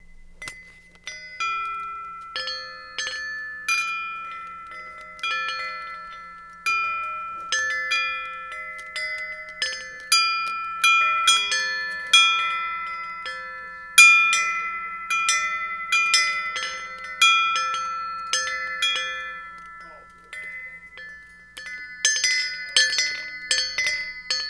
ship bell; seagifts

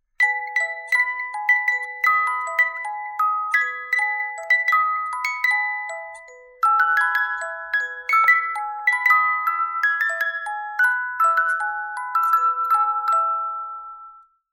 Wedding Song, musicbox

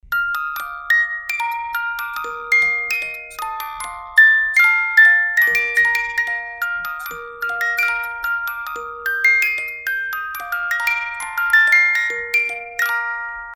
Magic Flute, music box

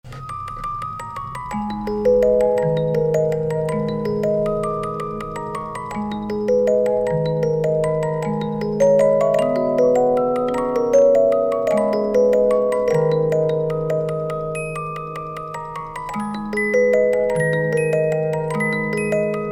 Fur Elise part 1, music box; SANKYO

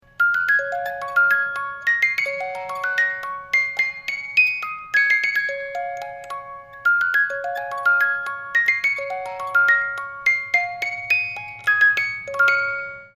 music box

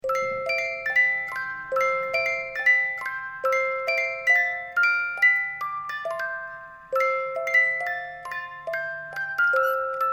English Country Garden

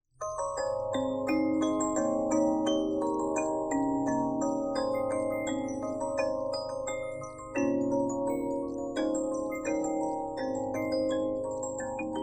Magic Flute, musicbox

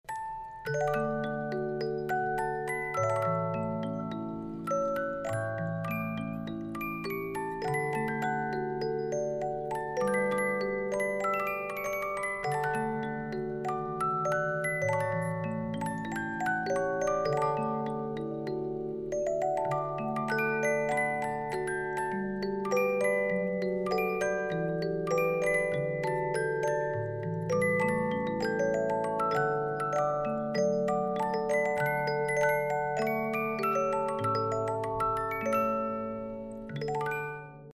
music box; SANKYO

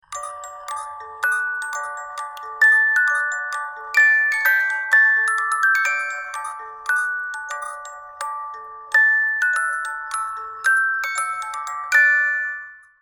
Faust Waltz, musicbox; Gounod

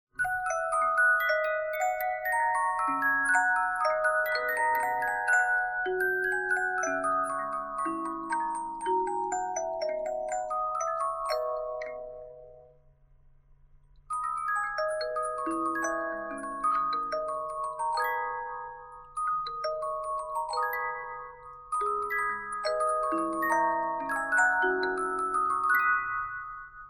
Serenade Minuet, musicbox

